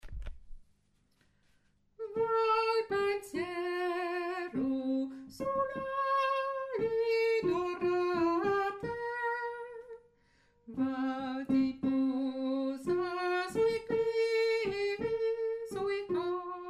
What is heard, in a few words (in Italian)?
va pensiero unisson